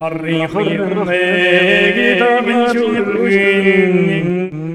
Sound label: MONGOL V FX, Asian Traditions; USB Soundscan vol.60; AKAI